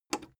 plastic button small simple click fast

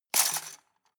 cutlery plastic multiple drawer place hard

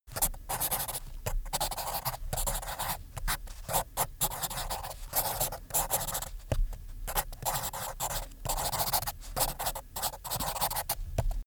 pen on paper

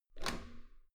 metal door heavy handle up hard

